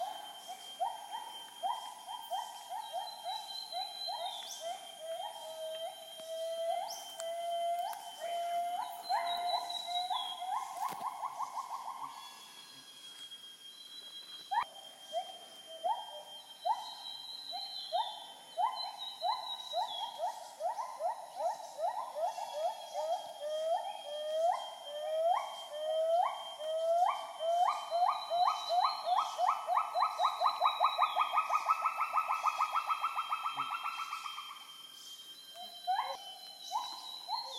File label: Borneo Gibbons